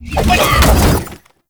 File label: Power Shroom Cast; Warframe; Nokko; Empowering Mushroom